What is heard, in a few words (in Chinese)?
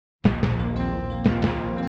片头音乐